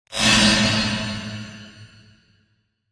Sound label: robotic sword swipe